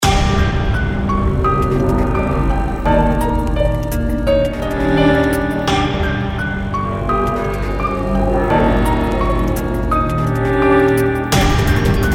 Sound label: Raid Boss; Mist Train Girls